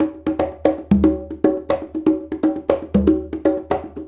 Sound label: Congas; Essential Percussion